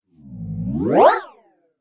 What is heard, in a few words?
Jump Build Up Whoosh; Whoosh Sound Effects